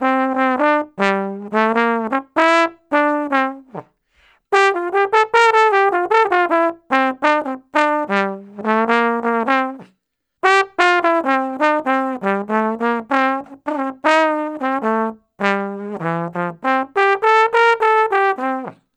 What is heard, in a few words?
Trombone Solo, Jazz; Sonic Foundry (Sony Creative Software); Jazz Solos and Sections